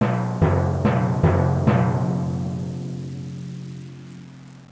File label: timpani